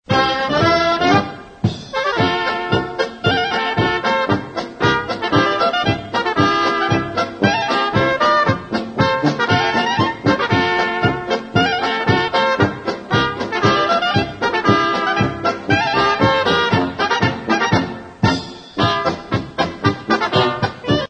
waltz

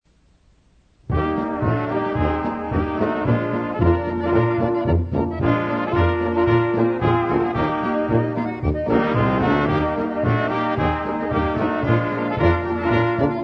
OLD SMOOTHIE POLKA

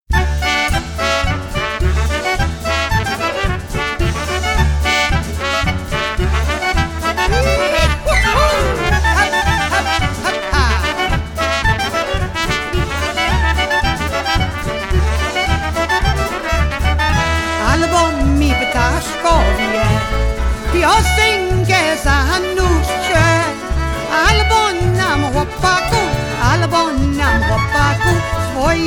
Singing Birds

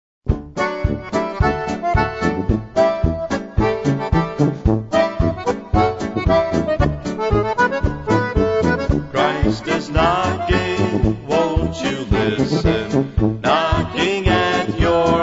POLKA

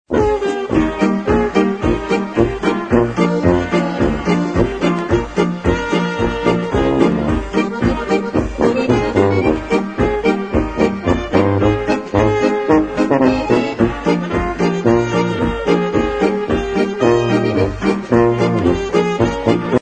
ANTIGO POLKA

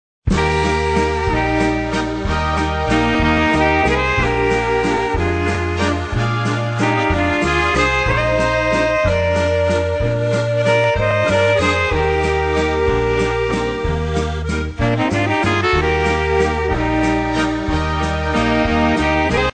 WALTZ

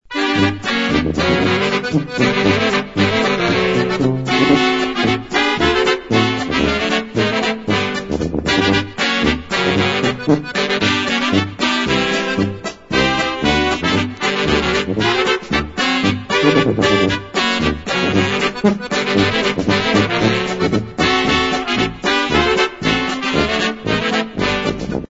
polka

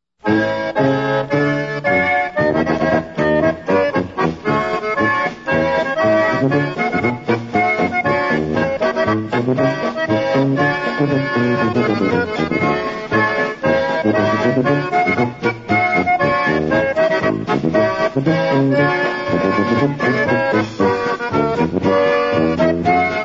polka

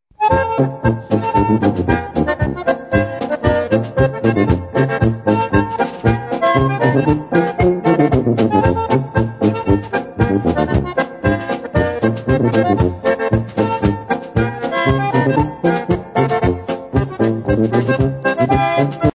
COUNTRY GENTLEMEN POLKA

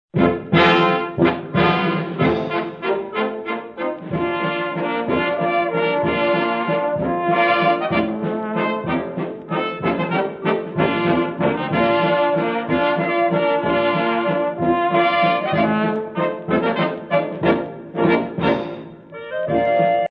laendler

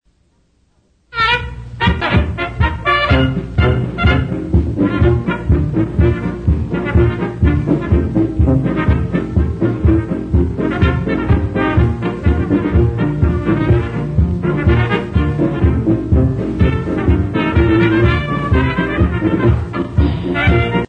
Schneider polka

